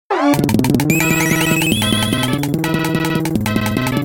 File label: stage clear